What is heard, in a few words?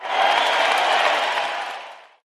cheer; Skillzgaming; mega Money Rush Desktop